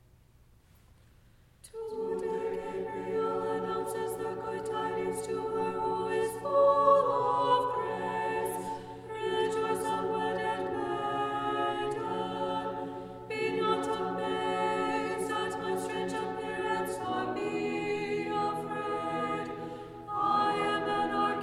Tone 2 Common Sticheron Example Soprano

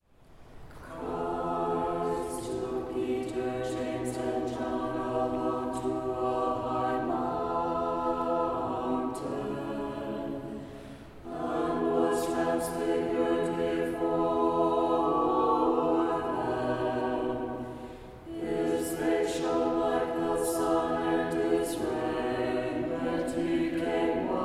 Tone8 Common Chant SATB; chanting tutorial

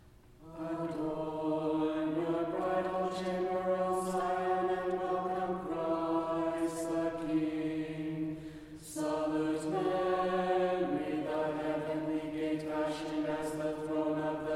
Tone7 Common Chant Unison; chanting tutorial

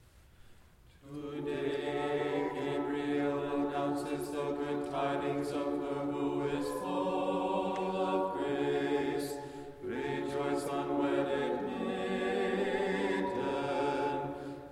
Kievan Sticheron Example Bass; Tone2

